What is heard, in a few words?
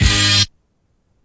orch hit; Punches Hits Discoblasts; single hits